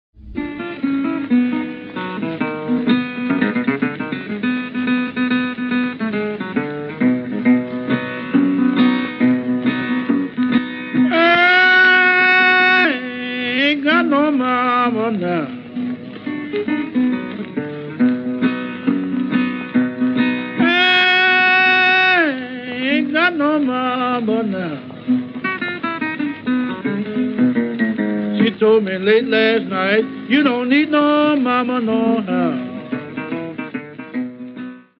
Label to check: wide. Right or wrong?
Right